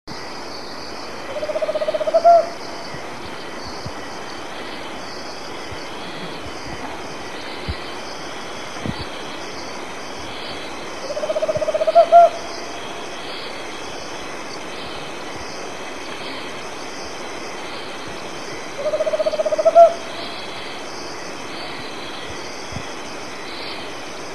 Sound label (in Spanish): Alilicucu Común, Otus choliba, Tropical Screech-Owl; cantos